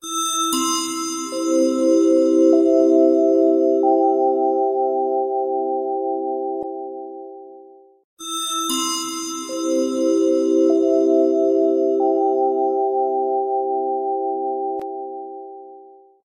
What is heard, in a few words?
3d Message; Nokia